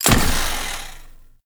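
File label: attack croc saw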